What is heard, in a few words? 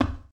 footsteps glass; player